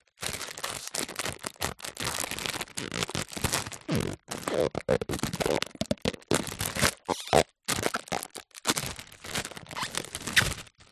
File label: foil birthday banner handling rub with finger squeaks